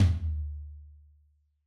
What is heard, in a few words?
Yamaha DJX 2B, Room Tom